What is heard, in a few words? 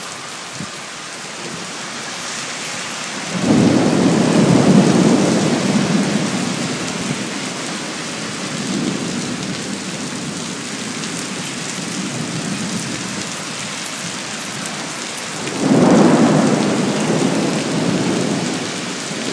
thunder and rain in shanghai